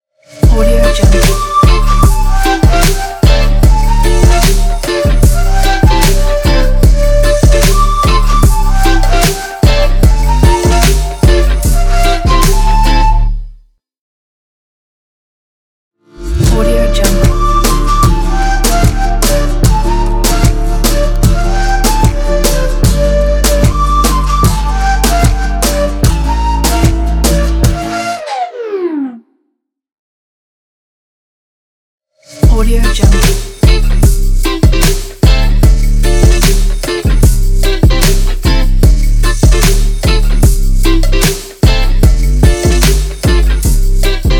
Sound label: latin pipe or reggae logo